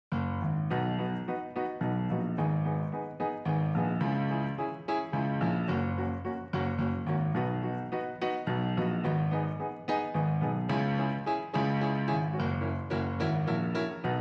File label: Piano